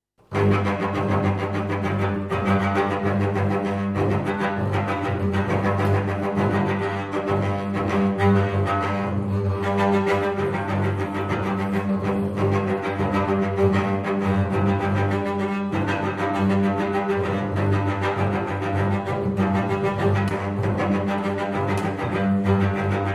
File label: Duo Vlc Kb